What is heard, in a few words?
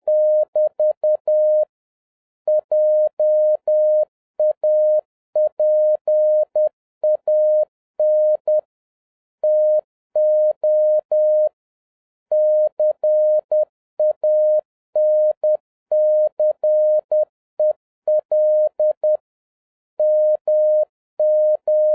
Morse code, 10 wpm; JAPAN TO CANCEL MORSE CODE EXAMINATION